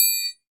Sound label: triangle, percussion